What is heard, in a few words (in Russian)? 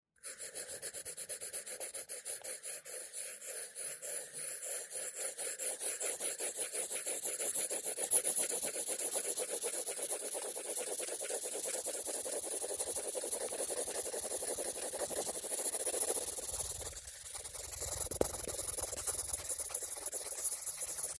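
karandashom, pencil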